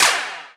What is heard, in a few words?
clap, drum machines